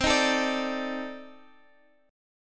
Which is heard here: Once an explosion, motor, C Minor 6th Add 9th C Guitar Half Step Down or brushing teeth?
C Minor 6th Add 9th C Guitar Half Step Down